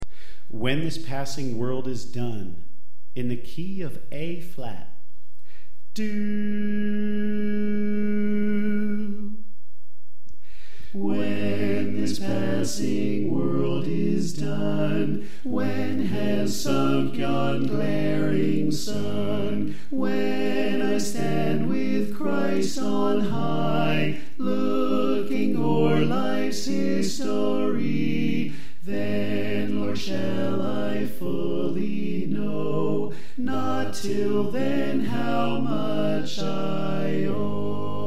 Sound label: Soprano Left